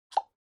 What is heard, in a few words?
OS Sound (Speaker-Notification)